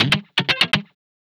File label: Guitar Chucka, Trip-Hop Loops; Sonic Foundry; Rondo Brothers Trip-Hop Loops & Samples